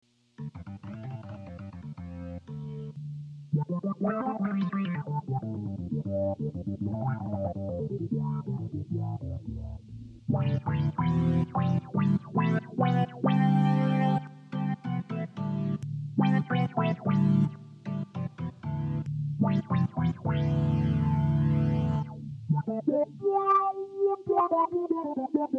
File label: envelope follower synth organ